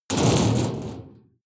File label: metal impact sheet bright rattle medium; hits explosionen; metall